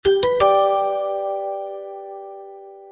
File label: win item